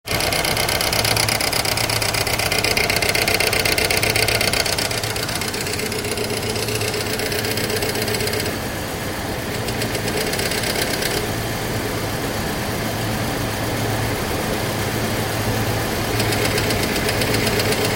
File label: Hyundai Accent SE Engine Sound